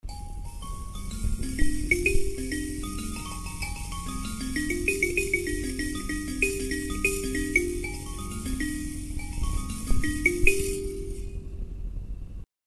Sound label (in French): sanza sans bourdon; LA SANZA